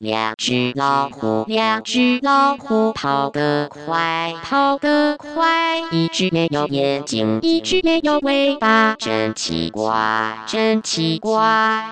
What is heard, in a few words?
song, syn song; singing voice